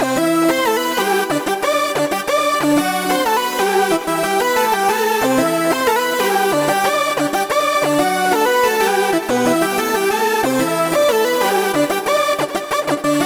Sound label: KOMPA; MODX KOMPA solo